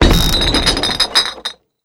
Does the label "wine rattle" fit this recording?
Yes